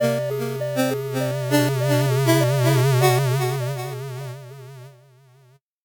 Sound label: 8bit jingle slow